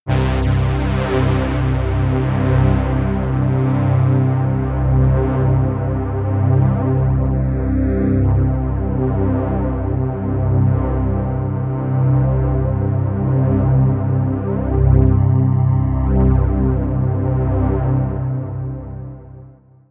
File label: pad sweep falter; flaechen